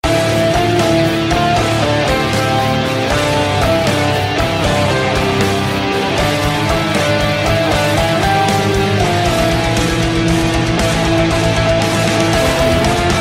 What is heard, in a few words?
Metal; WestW Meets Metal